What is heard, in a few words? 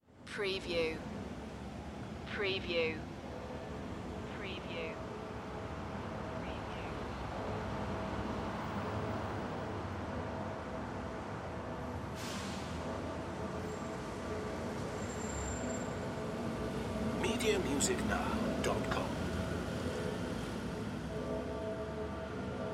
Italy Traffic; sound-effects-bible